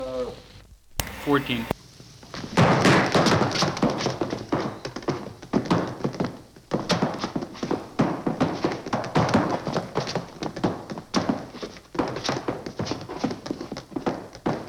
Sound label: Feet Scuffle